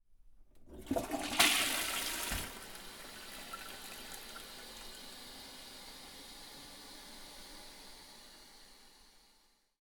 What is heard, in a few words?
Toilet Flush Short